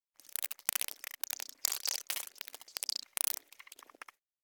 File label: Insect Movement Vocal Twitter; Hollywood Edge; sound designer's toolkit v2